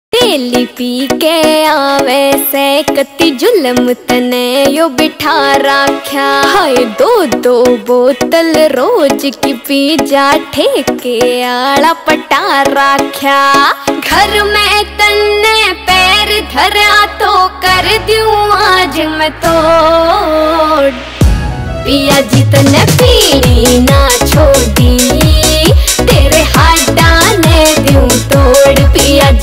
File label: haryanvi ringtone